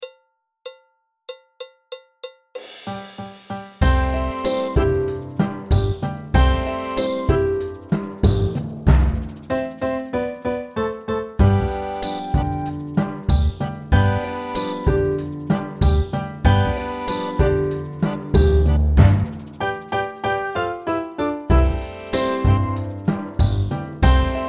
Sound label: La Cucaracha Backing Track, folk song